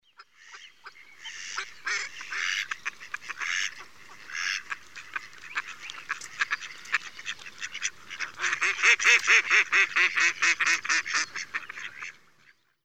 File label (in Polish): kaczka i kacze; karty obrazkowe; z kurnika do koszyka